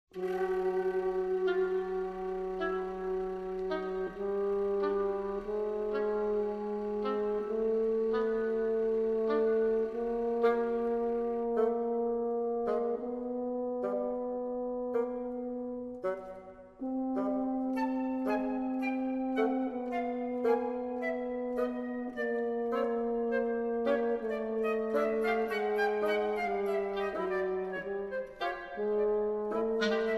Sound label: wind quintet